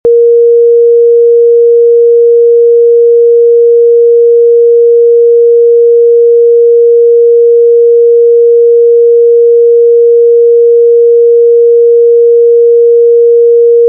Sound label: Horn